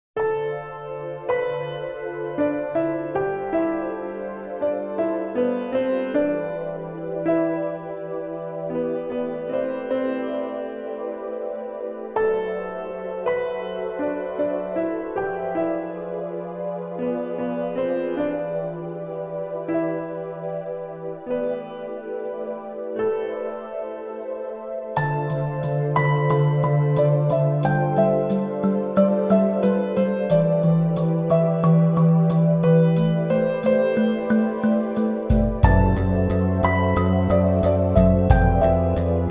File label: Pray Instrumental Version; Digital Devil Saga Avatar Tuner 1 & 2 Original Sound Track Integral